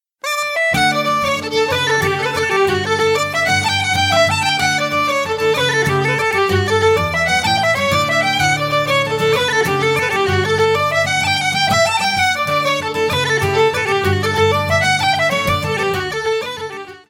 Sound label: Uilleann pipes; My Darling Asleep